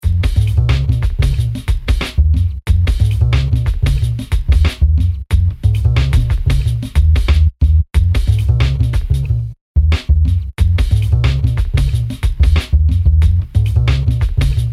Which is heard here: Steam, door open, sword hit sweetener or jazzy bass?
jazzy bass